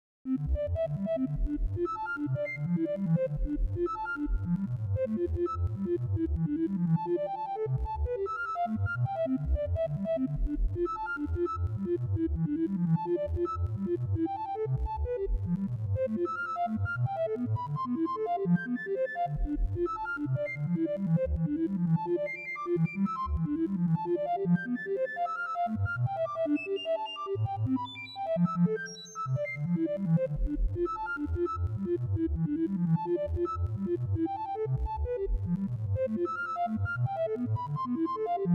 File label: recorder quintet taking turns to play; fractal music